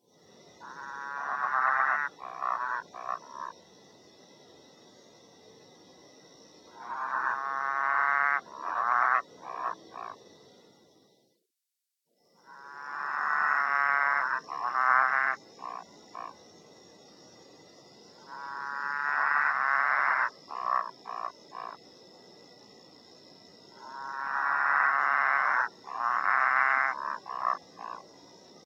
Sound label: Frog